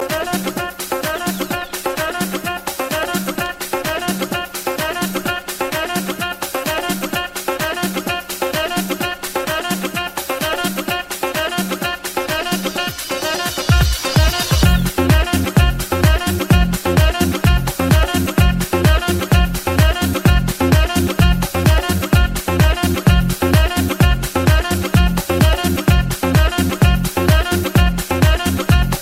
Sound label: Saxomotive